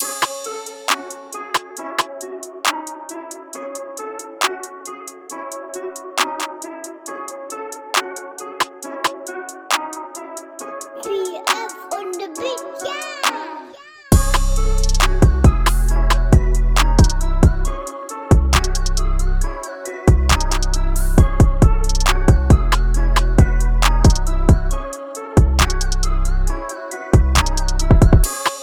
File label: 136 bpm